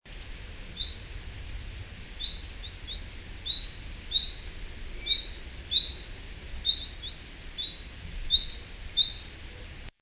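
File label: Chiffchaff (Phylloscopus collybita); 23.9.2001 Bierbza, Poland; Linnut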